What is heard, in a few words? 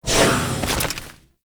Archwing Enter; Archwing Deploy; Railjack; Warframe; Cinematics